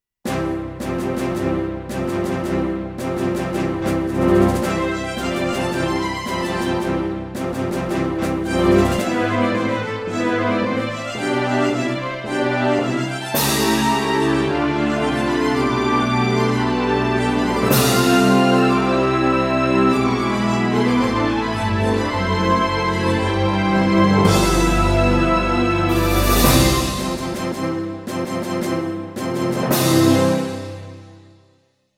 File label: strings; fp 5 demo